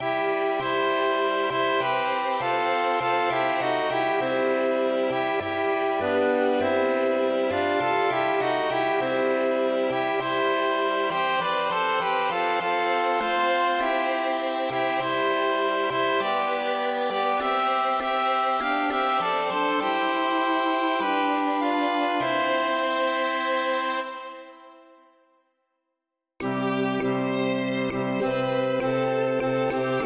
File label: polyinstru; hymns; Himnario grande